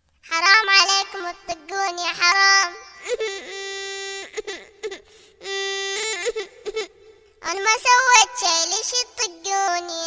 Baby dj cry

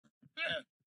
Llama

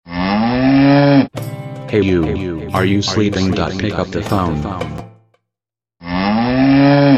Muuuuuuh

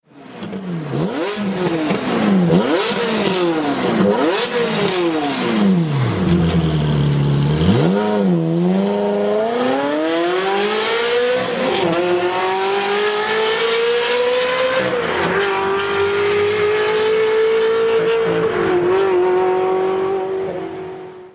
Ferrari 360modena F1

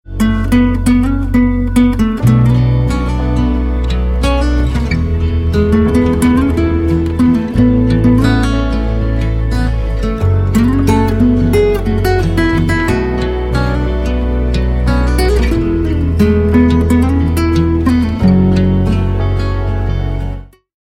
Lovely Guitar

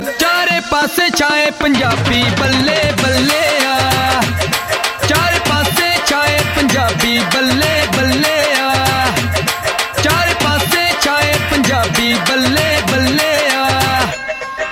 Punjabi

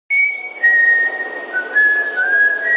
sweet whistle sms

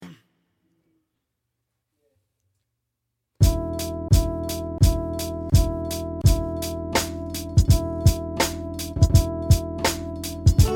Beat

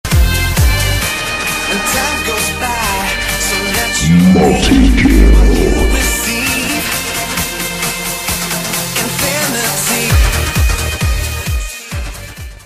Techno; Multikill

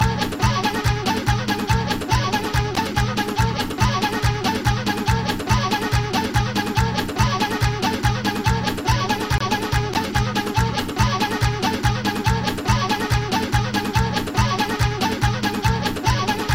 Kurdish Fluit

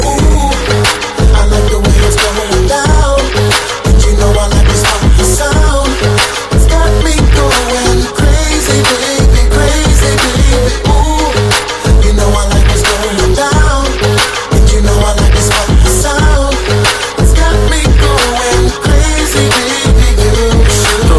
Crazy Baby Uuu

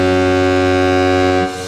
Goal Horn

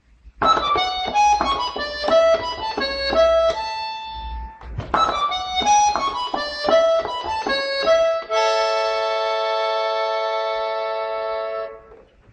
Accordion Nokia

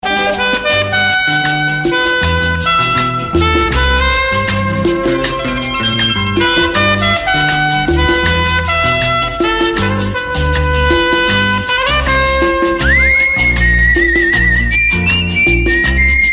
Hindi

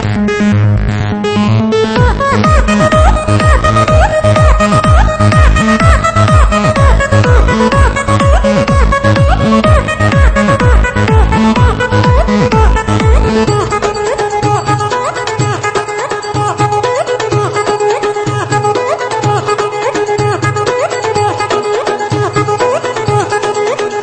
New Music Tone

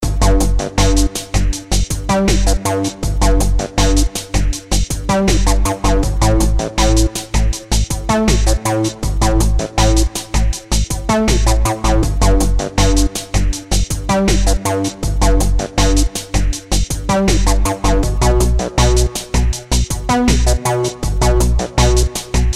Slow Techno

